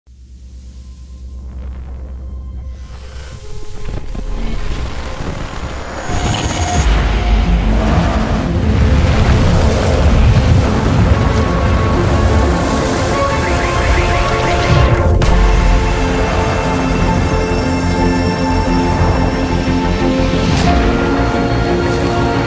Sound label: Cosmic music